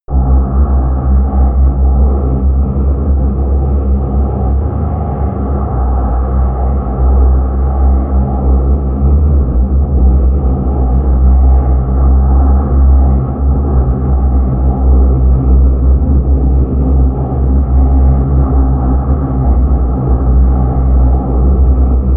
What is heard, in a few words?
drone stealth high